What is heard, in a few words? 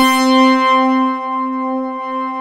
PULSE CHORD, TECHNO; Pro-Rec - Synthsonic; Z1 TECHNO